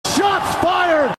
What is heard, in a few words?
SHOTS FIRED